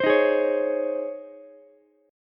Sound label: C sharp Major 7th Suspended 2nd, Ukulele; C#M7sus2 strummed; JGuitar